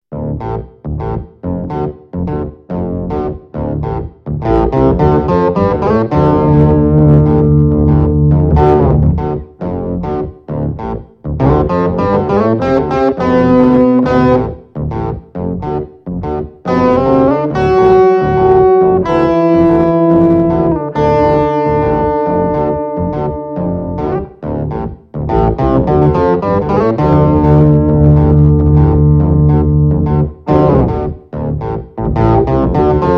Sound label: Blues Thing Bass Boost